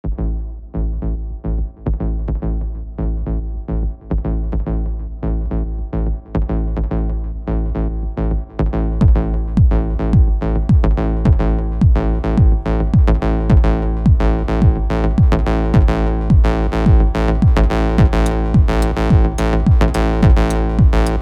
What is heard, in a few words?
acid test, 107bpm; transmuter; weeklybeats-2020